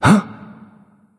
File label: surprise; smite; hou yi